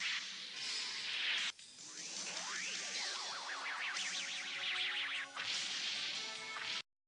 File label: horn